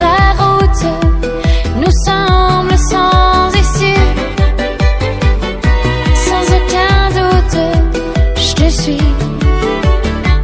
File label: austria